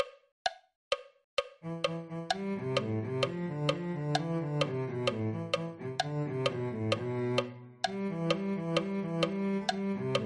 cello

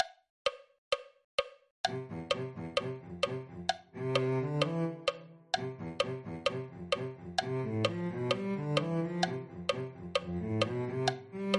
cello

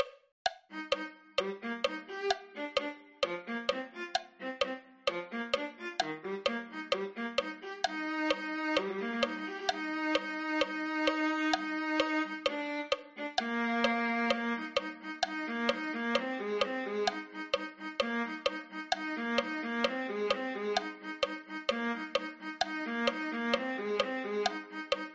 viola

